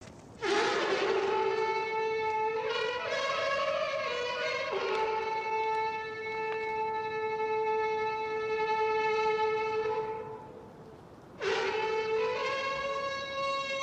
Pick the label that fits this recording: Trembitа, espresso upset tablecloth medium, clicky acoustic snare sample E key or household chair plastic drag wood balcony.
Trembitа